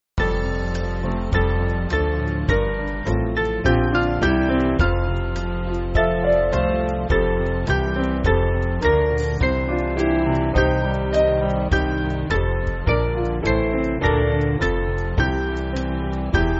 Band, Backing Files for Churches; Hear What The Voice; Wigton

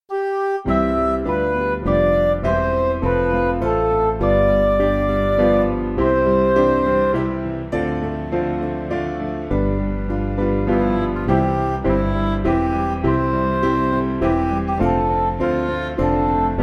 Music Backing Files for Churches; The Fields Are All White; Colvend

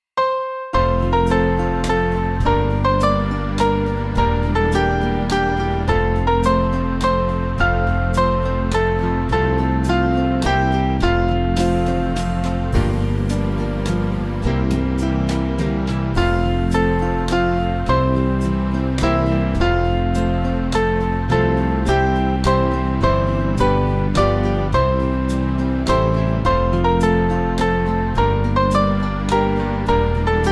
Band

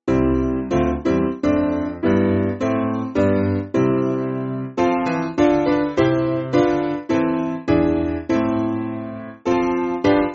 Piano, church music; Holy Spirit Truth; Lew Trenchard

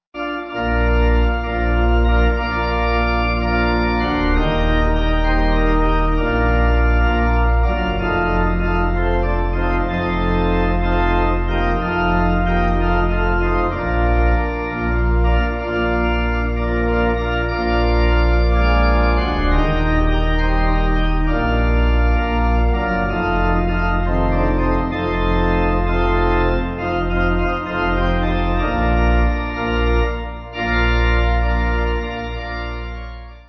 Pipe

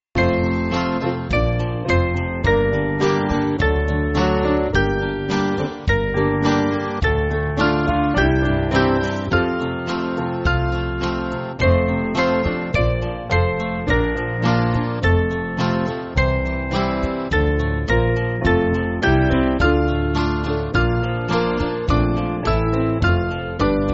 Band, church music; O Gladsome Light; Nunc Dimittis

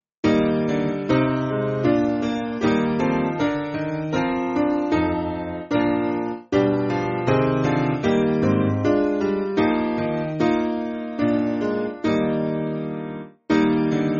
Piano

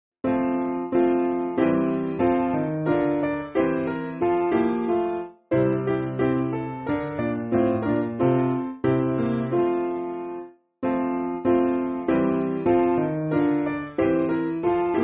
Piano, church music; Love Feast

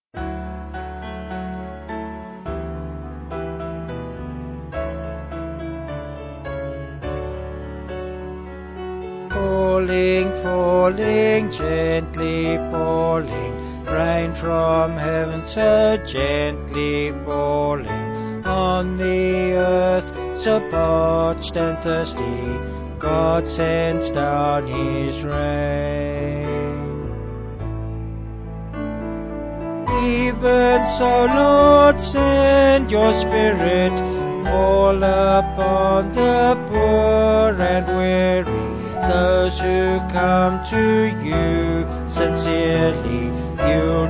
Vocal Band

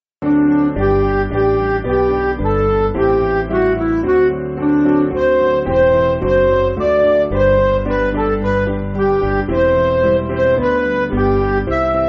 Midi

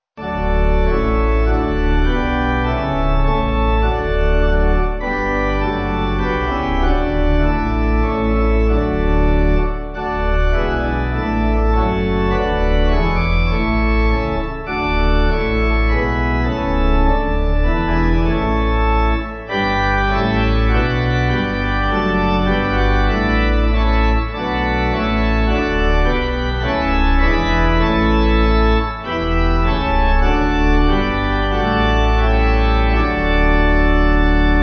Pipe, Pipe Organ, Backing Files for Churches; Des Vaters Sinn Geboren

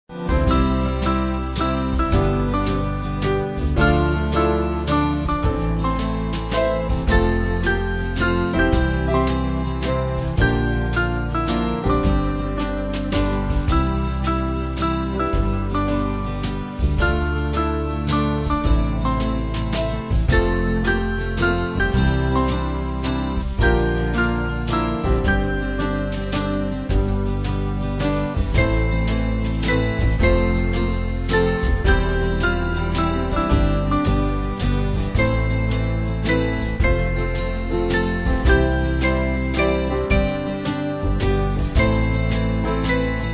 Band

